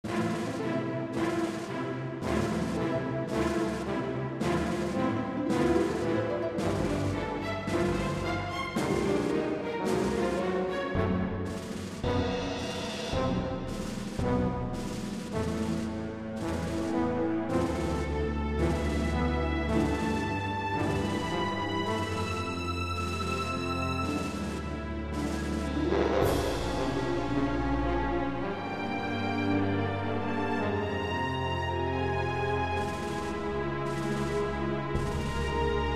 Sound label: March